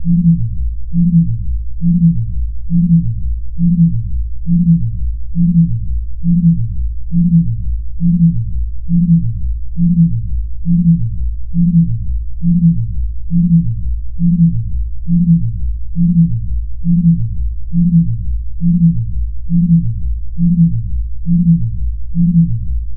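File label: sounds of time machine or teleport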